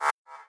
Teleport Darker

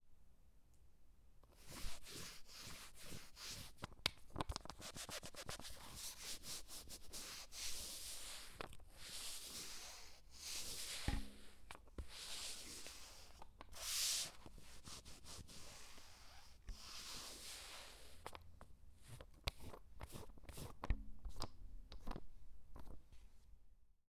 Small Dry Paint Roller

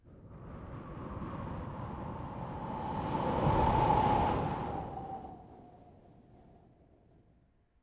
Wind

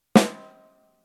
Snare

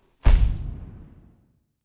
Explosion

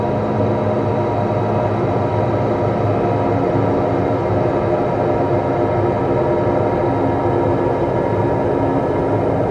Cool Ambience

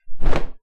Canvas Dropcloth Snap

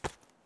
Clothed Body Hit

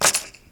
Key Drop

